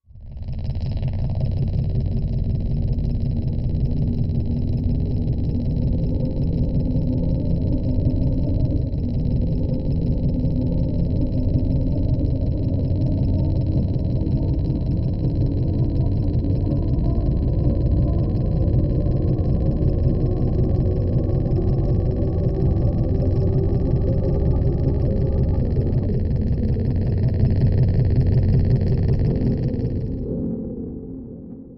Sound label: Idle Buzz, Machine, Low Idle, Buzzing, Electric, Drone; power core hum